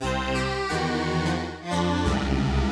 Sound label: Retro Negative Melody